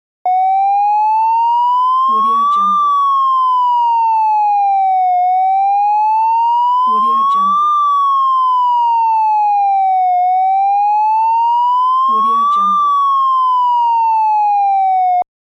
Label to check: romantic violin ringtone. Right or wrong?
Wrong